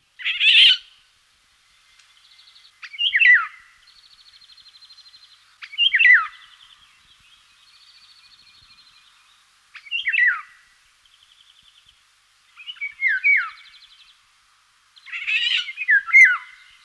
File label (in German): pirol, gesang; singvoegel; roche 2004